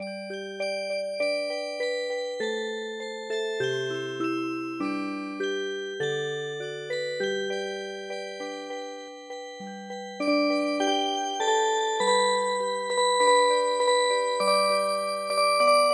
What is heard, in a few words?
Music Box, traditional; Tea picking